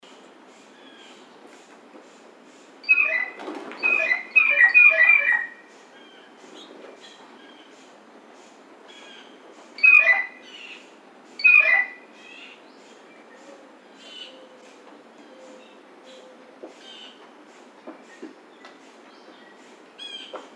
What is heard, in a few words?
Blue Jay